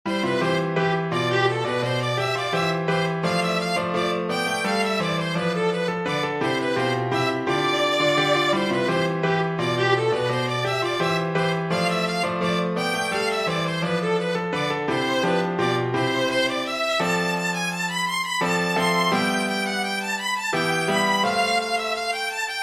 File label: gigue